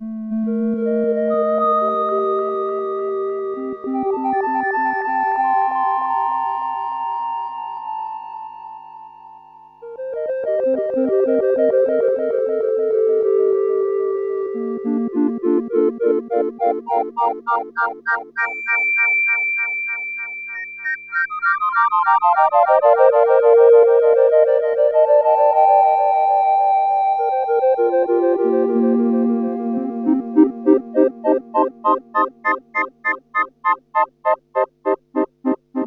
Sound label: Tuning